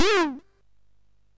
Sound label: SFX, MOS6581; Narco Police; MOS6581R3